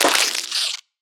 Flycaller Death

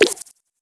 Double Jump

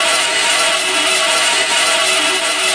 spinner Grinding; unsighted

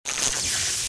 Joy buzz; The Henry Stickmin Collection

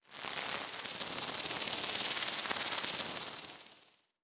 Spider Raid Insect

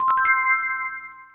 Upgrade; Mighty Fighter 2; Android

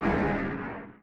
Dark Monster voice death; enemies

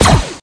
Blaster Sound

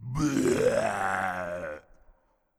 Skel Scientist Vomit

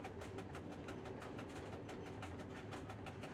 Hub Train Rattle Loop

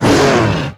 Monster Attack Finisher; Monster George Attack Finisher

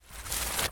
Footstep Foliage

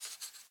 Sketch Env Write; Sx Maze Sketch Env Write 17

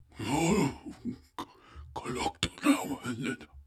Waterway; Gs Waterway

